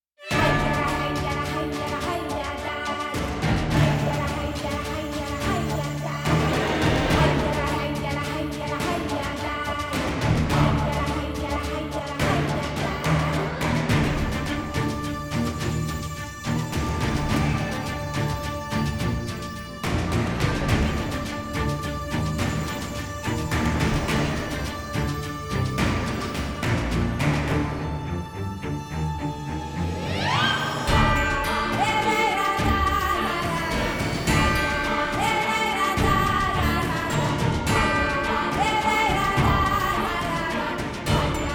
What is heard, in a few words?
Combat Loop; Toussaint; Bruxa